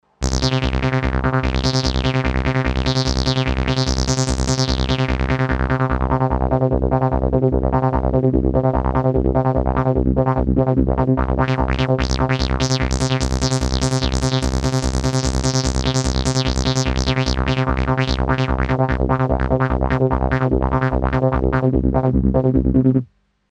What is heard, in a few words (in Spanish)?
arpegio; maestro